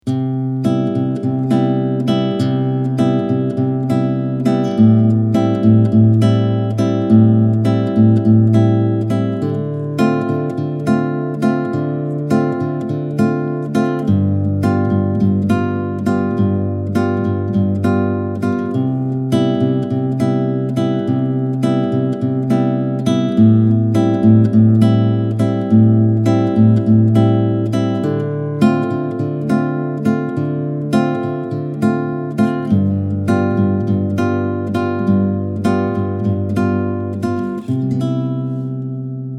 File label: Bossa easy exercise